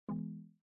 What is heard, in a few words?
wrong